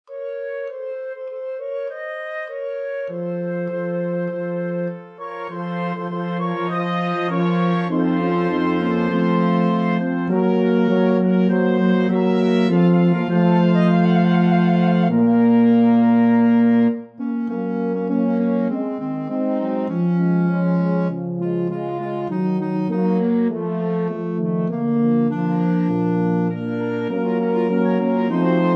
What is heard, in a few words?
Brass; Nevedomaya Miru Krasota